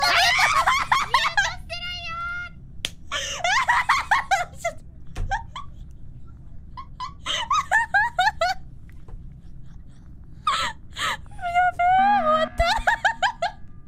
Laugh; IRyS

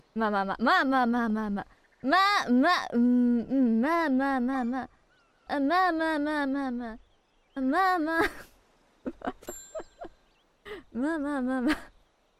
mamamamama

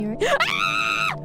jumpscare; IRyScream

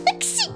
Sneeze

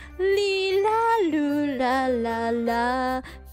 Singing; IRySinging

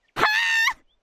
malding scream; IRyScream

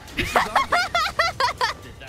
laugh

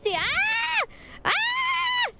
falling scream; IRyS

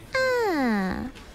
hope noises

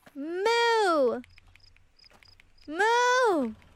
moo moo

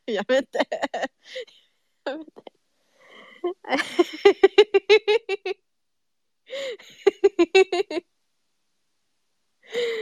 blessed laughs; SpaceRyS

